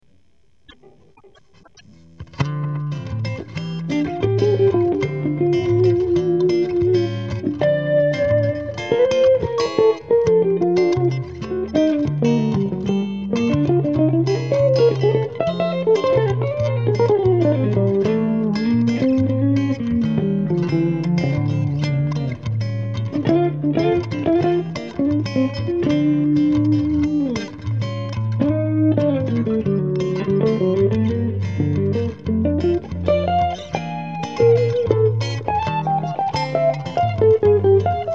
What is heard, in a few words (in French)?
guitare; orpheus